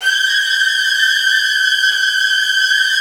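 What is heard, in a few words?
violin trills; Virtuoso Strings; G4; ILIO; Kirk Hunter Virtuoso Strings VOL-1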